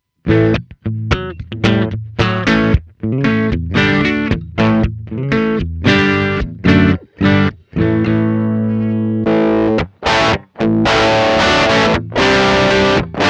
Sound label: Tech 21 SansAmp PSA 2.0 Fender